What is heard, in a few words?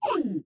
hurt; Learn to Code From Zero With Godot; mobs